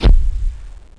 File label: thump; duke nukem total meltdown